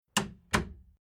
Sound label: Household Door Small Door Closed Light Latch Handle Sound; Miscellaneous Door